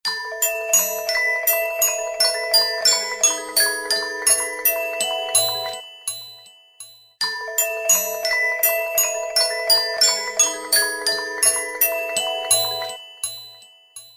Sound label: fog on the water ringtone; samsung galaxy s4